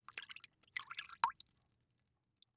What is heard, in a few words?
Liquid Water Movement In Metal Bowl Finger Splashing Short